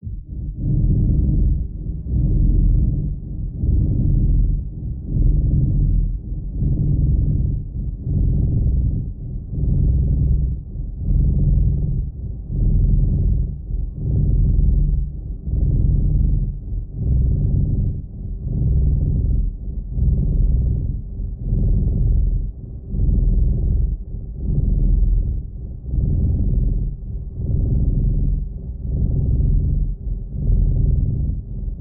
FOREST ROOTS INTERNAL ROOT BREATH E PHRYGIAN 80 BPM